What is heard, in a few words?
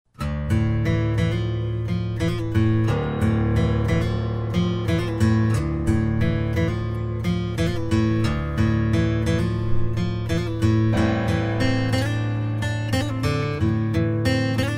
Guitar; Mobi-hybrid Musician Templates